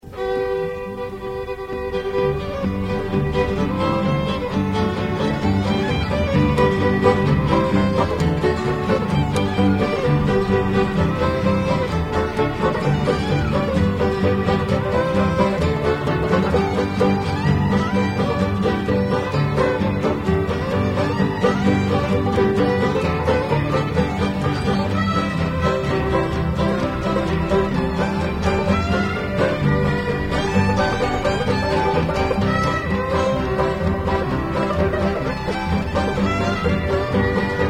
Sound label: Polka